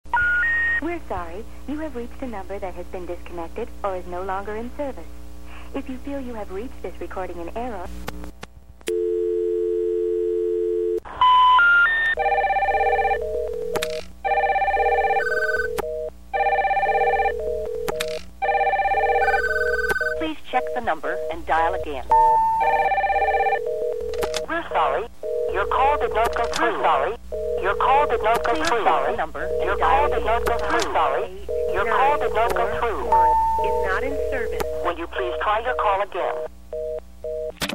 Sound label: Telephone Tones